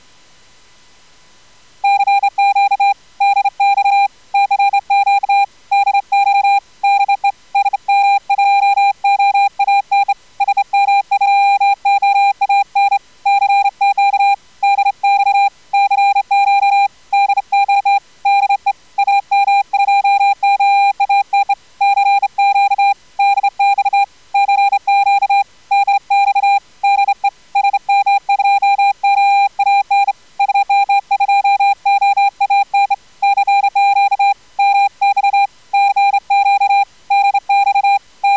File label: CW jitter